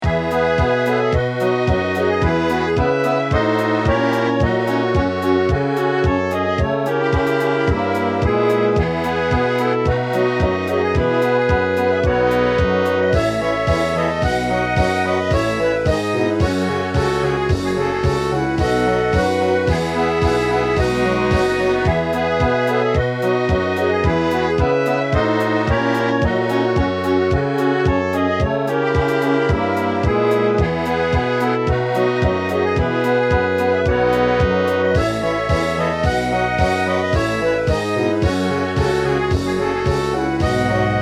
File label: French Cabaret